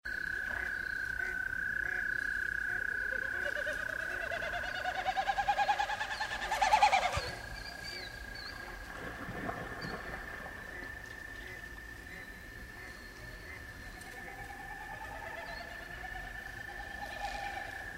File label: Nighttime Shore, minnesota lakes